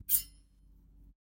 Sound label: sword swipe; soundscrate